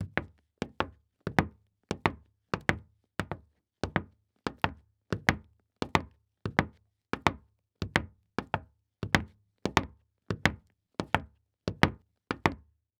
Foley Footstep Hard Sole Dress Shoe Walking On Hollow Wood Surface; Foley Footsteps